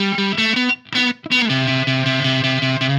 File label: Happy Pub Guitar Riff, Indie Rock; Sonic Foundry (Sony Creative Software); Indie Rock Anthems; Session 05 - Happy Pub